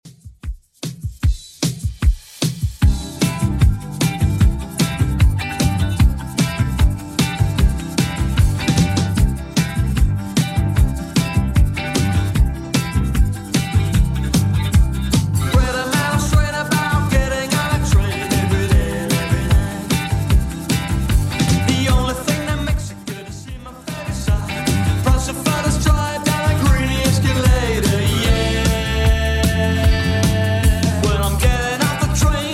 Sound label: 80s Redrum, 151 bpm